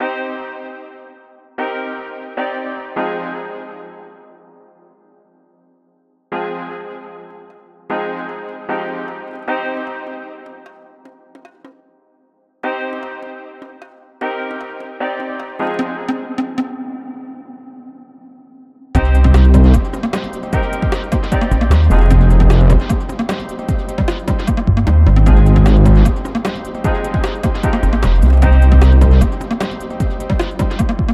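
frozen fuzz, LOVELY AND INFINTE, To create infinite textures and pads, we put guitar 3 three different fuzz machines, flipped the result and merged it with itself.; CINEMATIQUE; KLANG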